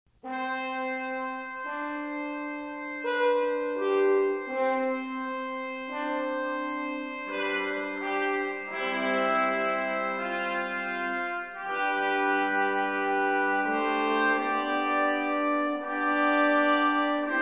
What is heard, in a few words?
Orchestra and Choir, Hymns